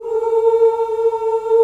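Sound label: B3 FEMALE CHOIR, OOS; Northstar - New Gold VOL-2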